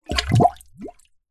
nature water bubbles metal hollow pole sink submerge plastic tub